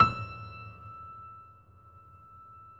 PED MF E, GRAND PED; Best Service Advanced Media Trax Modern Composer

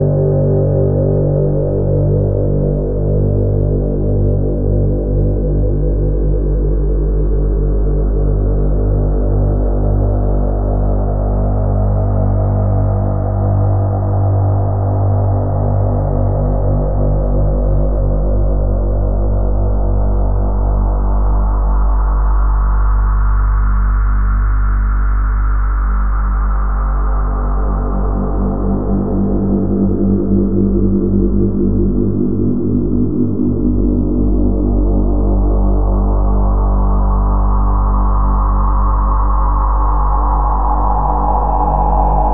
SHADW DRONE

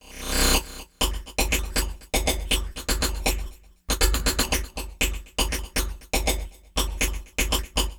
Percussion Loop; Drums & Percussion; Electronic Point Blank

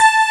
DG WAVE, DIGI SYN

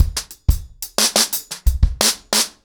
ACOUSTIC DRUMS, 90 BPM

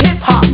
hiphop; Amiga Soundtracker Sample Packs

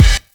kick cym; TrashLibrary; Drums

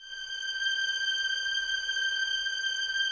G 6, 11 Violins, Soft; Miroslav Vitous

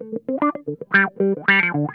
WORMY, Funk Guitar; Zero G - Funk Guitar

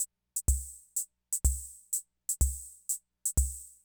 Loop, classic machines; arranger

